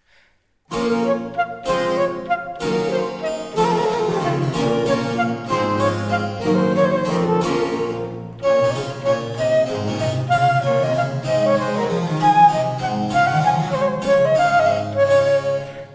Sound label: Concert Rondeau